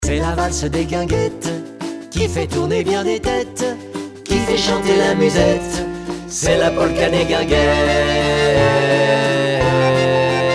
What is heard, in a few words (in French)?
La valse des Guingettes Baryton